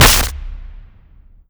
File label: bolt huge blast